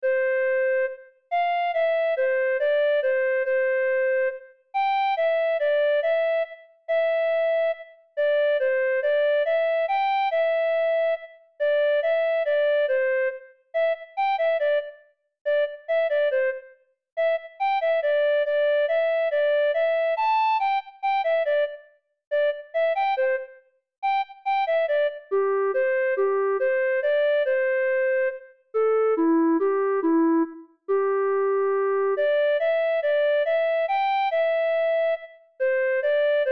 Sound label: Waterlily Sonatina, for Flute; Movement 1